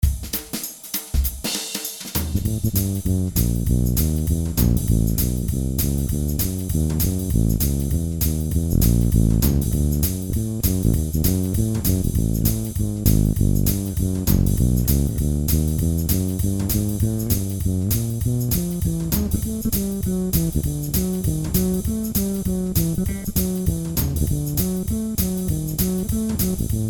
Fretless Swing

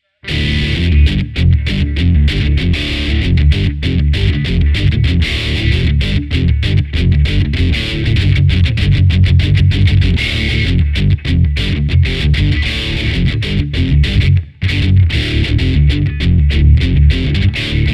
Heavy

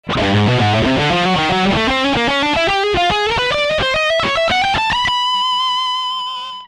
Buzz Solo

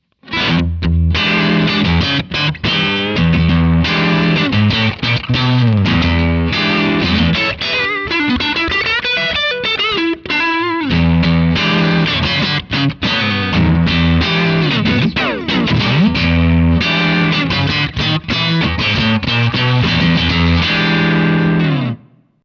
drive box; linfloorpodpl

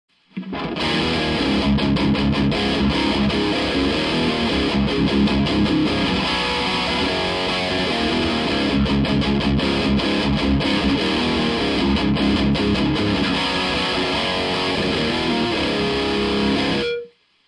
RG75 Mod Gain

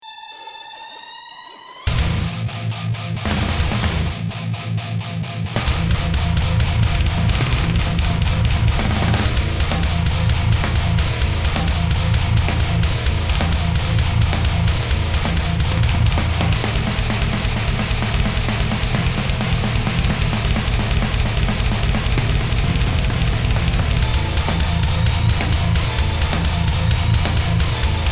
Reason Drum Kits Hard harder; PPHDRUMREF

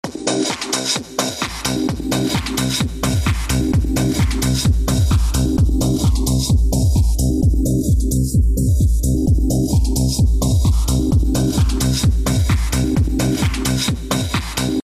bp filter effect

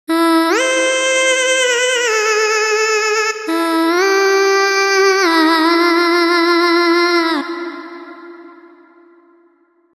Solo Vocal

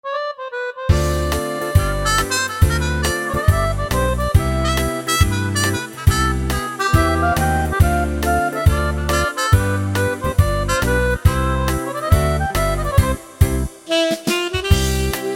folks

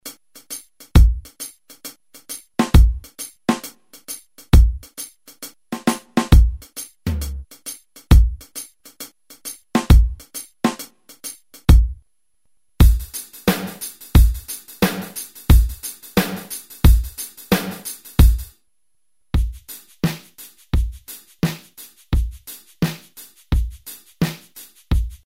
Soft Beats

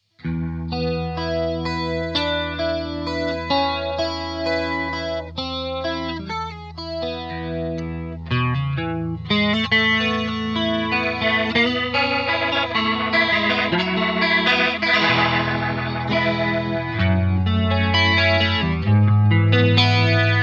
Rotosphere; HUG ROTO II

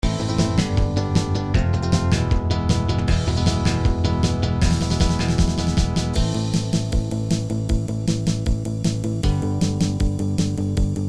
surf

